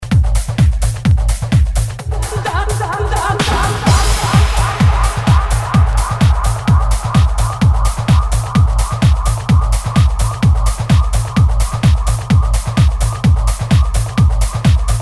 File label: Dance